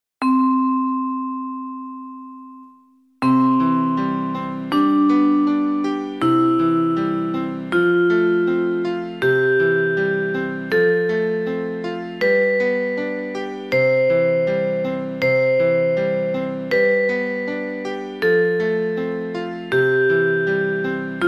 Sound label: C Major Scale